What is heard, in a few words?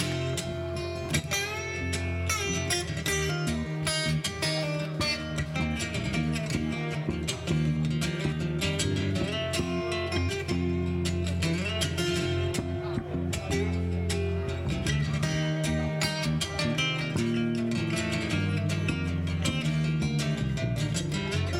Jam Loop; Hungersite